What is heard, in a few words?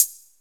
O HAT; OTHER DRUMS; Rubber Chicken Software Sample Bank; DRUMS + PERC